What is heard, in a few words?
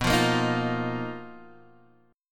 B Major 7th Flat 5th B Guitar Half Step Down